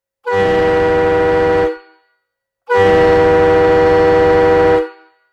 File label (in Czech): 4-tónová fanfára 400mm chromová bez kompresoru